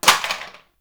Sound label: Plastic Drop; Warframe; Ambience; Nineteen Ninety Nine